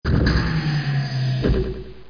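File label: door close; FORSAKEN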